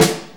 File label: Snare C, Snare Drum; Sonic Foundry (Sony Creative Software); Drum Tools